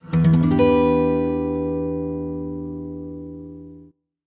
Acoustic

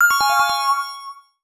Float E-mail; Sony Ericsson; Xperia X1

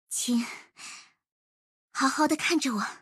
Sms, notifications; OnePlus; Ace 6T Genshin Impact Kamisto Ayaka

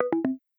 Low Battery; Lenovo; ZUI-12

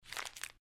delete, ui; Tecno Phantom V Fold

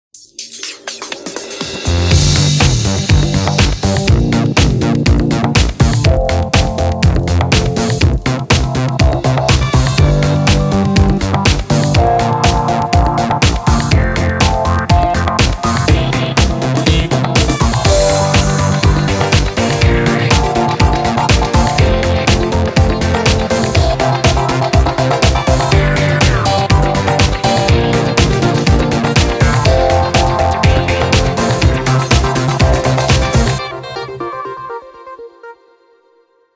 Nokia Tones; Windows Phone apps

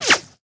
Whew, notifications; Infinix; XOS 12

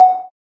Video Record, ui; SKY; IM-A890S VEGA Secret Note

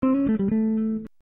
jazz insert; Nokia N78; Audio themes; Jazz MMC insert

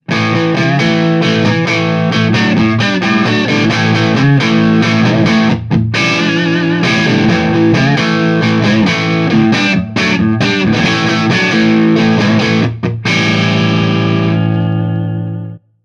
Rock, ringtones; Marshall; London KB 1501

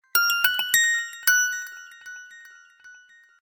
Ringing; NGM; WeMove Miracle

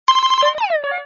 Alert; Motorola; E398-Hijacked-by-MTV

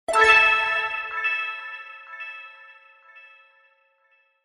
Fadeout; China Mobile; A3s

